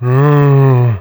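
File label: zombie select, creature; GammaAge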